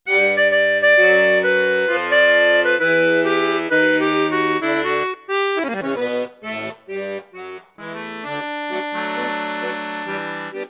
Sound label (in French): registre basson, accordeon; paolo soprani